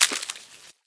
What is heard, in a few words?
Defeat Attack; smite; god bakasura